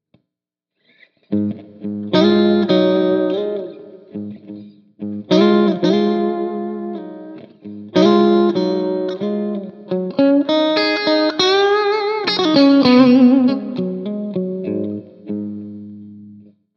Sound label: 62 Bridge middle clean, pickups